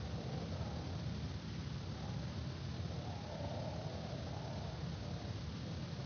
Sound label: Ambience Loop, outdoor